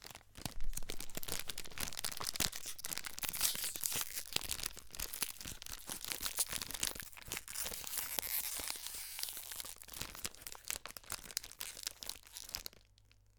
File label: opening croissant; Chipicao; NS Studios - ultra sound collection; human